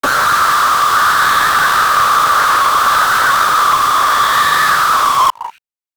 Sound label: distorted screech; distortion